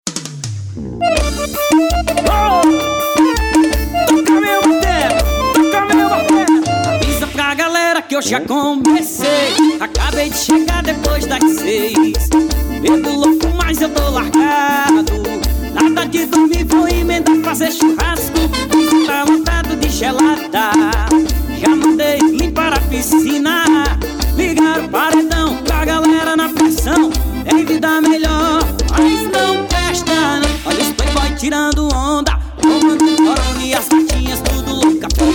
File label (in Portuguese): promocional paredão; corona